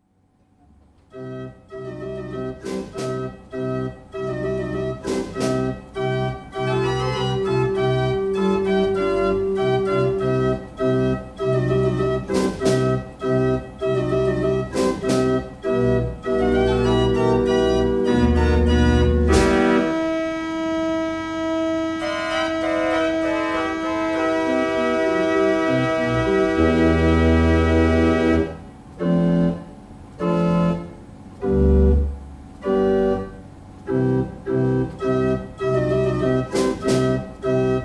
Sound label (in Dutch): pique, concertorgel; A. Ruth no36